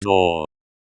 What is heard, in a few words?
door